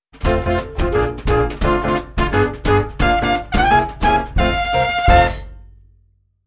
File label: Long Sting